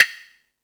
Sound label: Percussion FX, Drum Machines